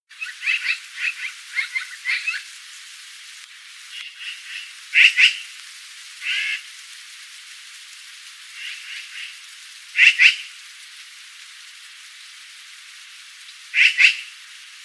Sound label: Black-billed Magpie, Pica hudsonia; jays and crows